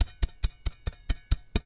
Industry Shovel Chopping Dirt

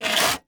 Household Metal Pan Scrape On Cement Fast

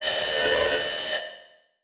Multimedia Internet CD-Rom Flash Wipe Electronic

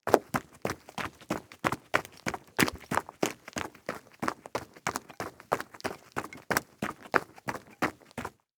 Foley Footstep Hard Sole Run On Leaves And Twigs; Foley Footsteps

